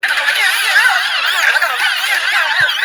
distorted

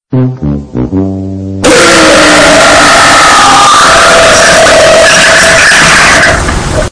losing horn; the price is right; burp

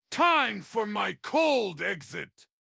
phonk; thragg

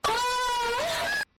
goofy loud ahhhh

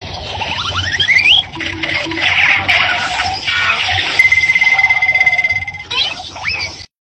cartoon combo

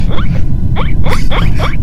guinea pig laugh

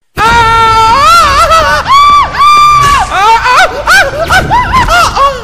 aoaoaaaaaoaaa

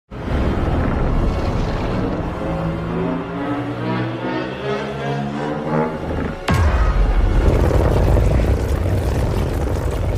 godzilla mining drill

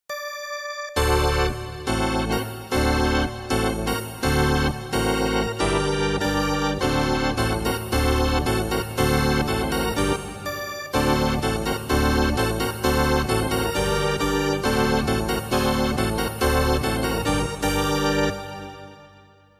piano

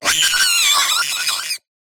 jumpscare

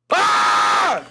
scream

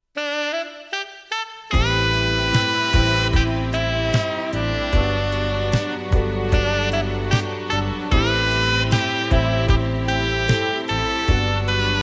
orchestra hit

